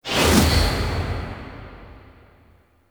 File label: Launch Whoosh D; Railjack Dock; Cinematics